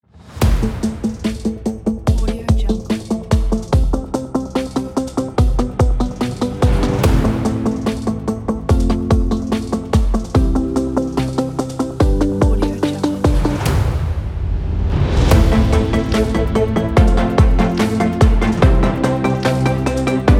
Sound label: epic ambience